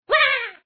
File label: Man Shout; hammer